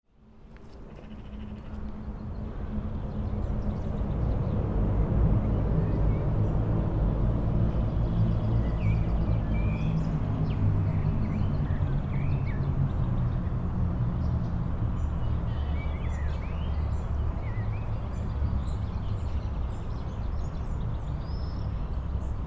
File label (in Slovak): Košice mestský park